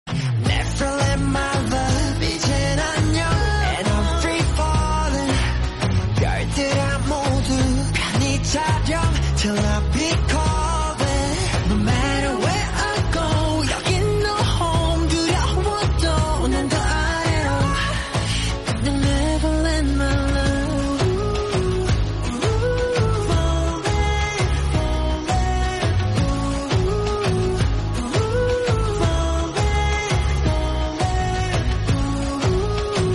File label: korean song